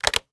Switchboard Telephone Receiver Down; Communications; 1001 Sound Effects

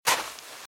Footsteps - Sand - Dry - Walk - Left; RadwinIsland

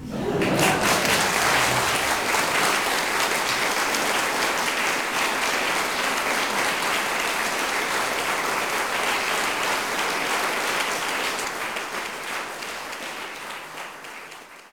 Applause; best service Blue Box

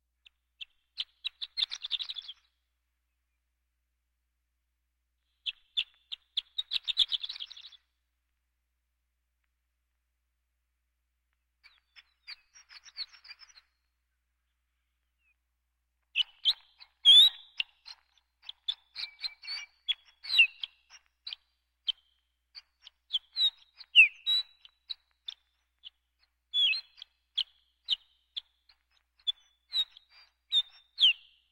Birds

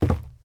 zombie footstep wood; DeadFright

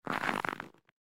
Oak Tree; Blind Quest - The Frost Demon

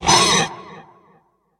Great Wisboar Grunt Death; Kilta

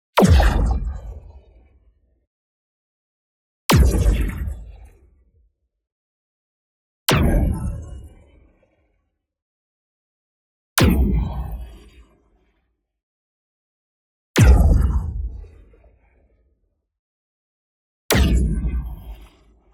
IMPACT MEDIUM Spectral Hit; boom library; Matter

